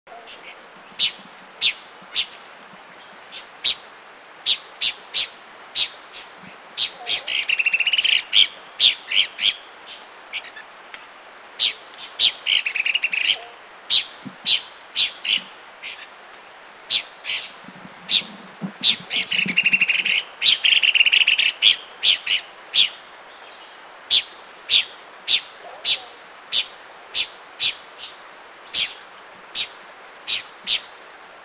Abotts Babbler, Thailand

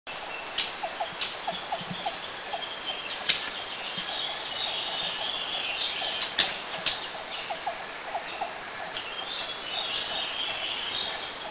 Spectacled Spiderhunter

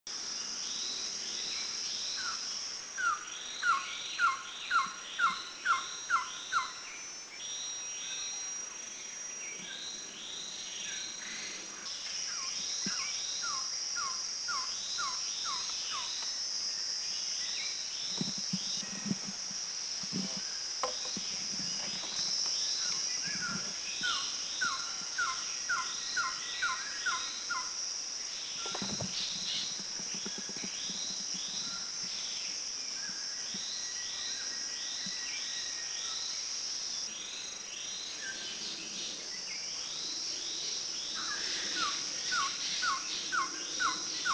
Red-headed Trogon Khao Yai, Thailand

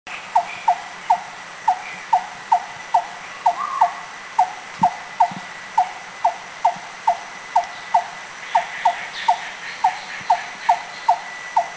Red-throated Barbet, Thailand

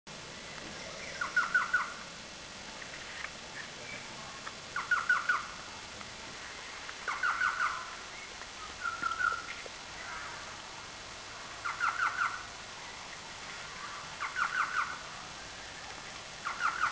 Orange-breasted Trogon, Kaeng Krachan; Thailand

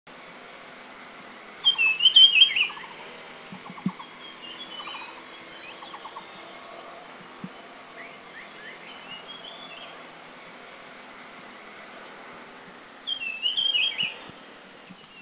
Dusky Fulvetta, Anmashan, Taiwan; Alcippe brunnea brunnea; Seen well at Anmashan

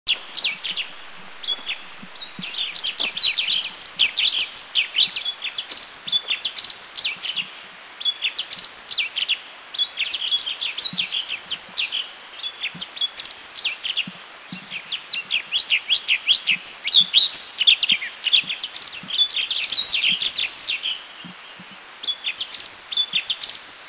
Orange-bellied Leafbird, Thailand

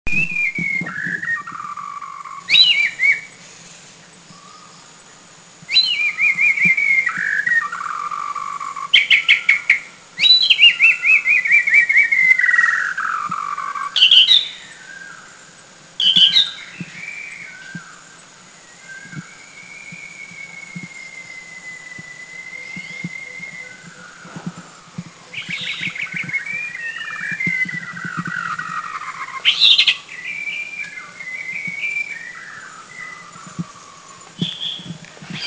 White-browed Shama, Philippines

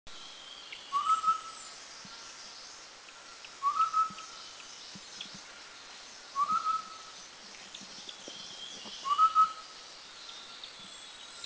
Streak-breasted Scimitar Babbler; Mishmi; India

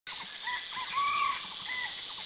Red Junglefowl; India

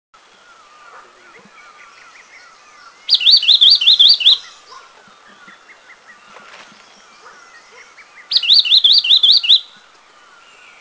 Rufous-throated Wren Babbler; Eaglenest; India

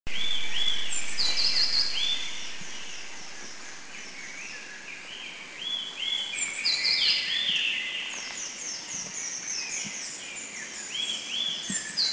Elegant Tit Makiling; Elegant Tit Pardaliparus elegans Country endemic; heard Makiling

